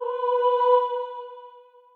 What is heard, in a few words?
choir